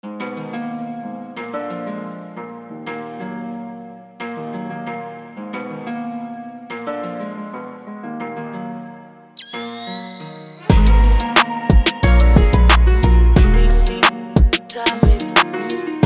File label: Dream Chaser Beat Vox